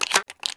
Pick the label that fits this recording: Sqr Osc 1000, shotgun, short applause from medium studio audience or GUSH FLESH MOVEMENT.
shotgun